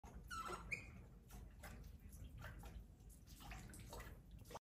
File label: Pied avocet; نوک خنجری